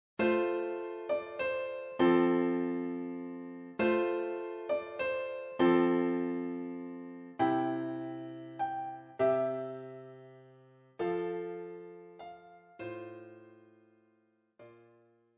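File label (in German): 4 händig; Stille Nacht heilige Nacht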